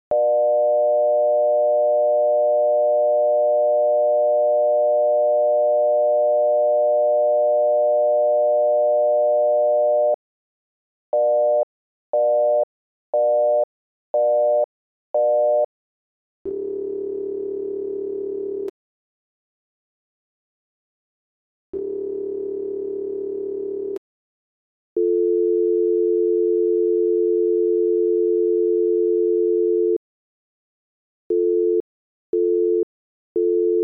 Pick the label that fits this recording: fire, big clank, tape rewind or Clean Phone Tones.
Clean Phone Tones